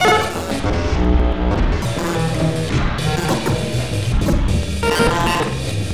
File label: Jazz Noise; Sonic Foundry (Sony Creative Software); Leo Cavallo Bass Taster; Special Fx